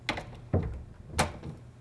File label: door closing